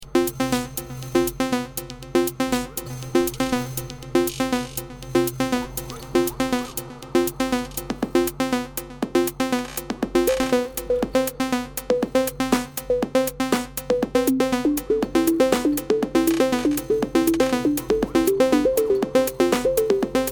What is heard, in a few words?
rhythm disrupted